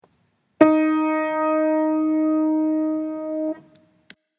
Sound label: alto ii eb